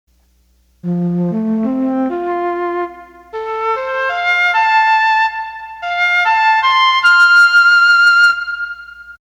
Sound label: Flute